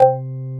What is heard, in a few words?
Korg CX3, C 1; Back In Time Records; Korg Universe VOL-1